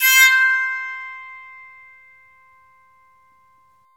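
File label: bowed psaltery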